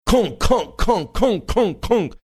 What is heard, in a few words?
making series banging sounds